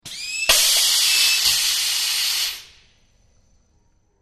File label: Air Release Valve